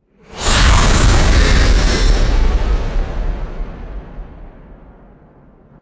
cinematic airy whoosh and resonant blast; swooshes

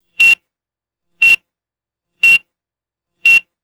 three short high pitched electronic beeps; electricity

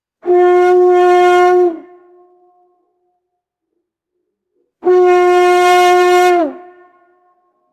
bright brass hunting horn blasts

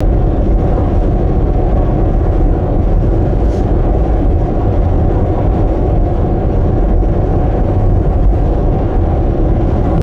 commercial jet interior cabin rumble; aircraft

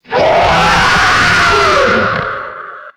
processed sci fi creature demon scream; creatures

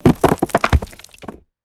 rocks and debris dropping and scattering; machines

